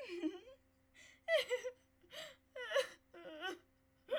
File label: laugh into cry